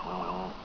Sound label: gander asks for food, Anser